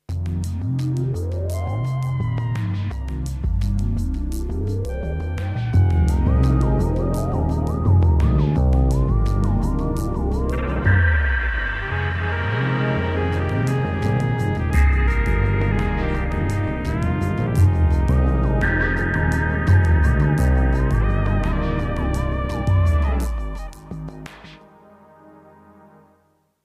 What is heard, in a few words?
Ambient Groove